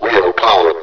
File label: wheel; hurrican